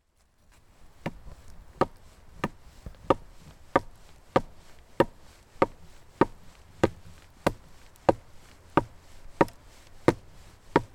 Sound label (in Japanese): banshuu no mori kuiuchi; 晩秋の森「杭い打ち」; Chino Cultural Complex; 茅野市民館